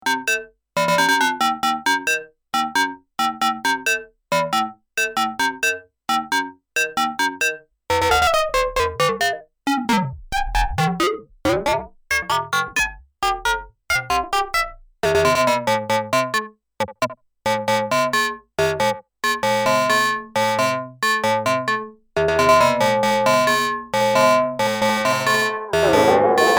Reface CS Ringmod

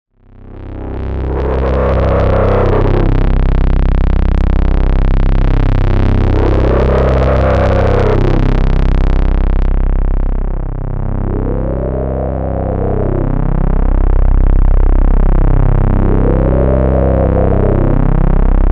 Moog Moogerfooger MF 101 Screamer

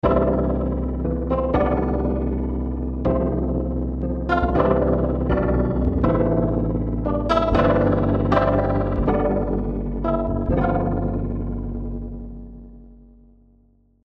Emotional Piano ROTARY; Pigments; CLS 222 Go Too Fast

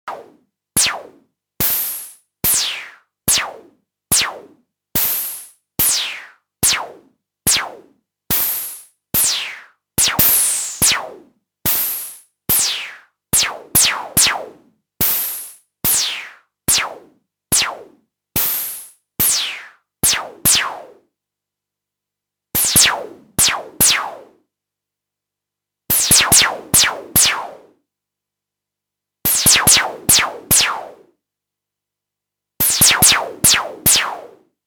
Lasersweeps trigger cv express

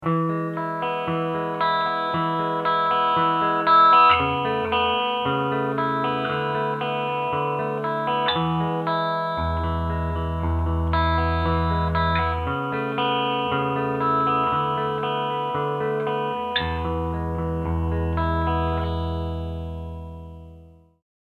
sweet picking

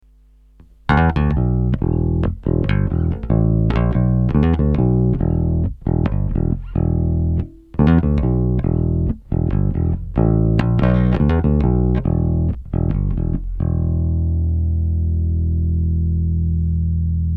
digi amp

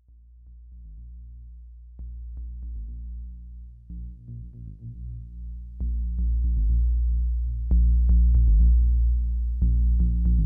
pulse sequ

